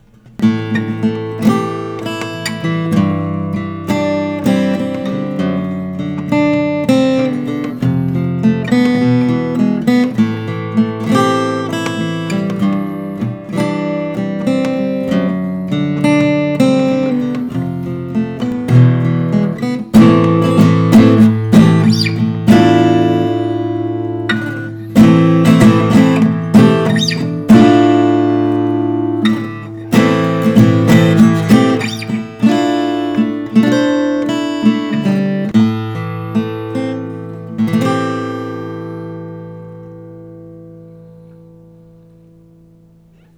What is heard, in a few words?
martin custom 0 12 fret ss cedar